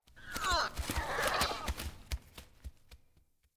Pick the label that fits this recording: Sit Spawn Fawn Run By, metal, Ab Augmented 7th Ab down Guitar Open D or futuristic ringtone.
Sit Spawn Fawn Run By